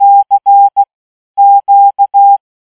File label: morse code CQ